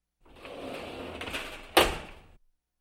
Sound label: Open sliding door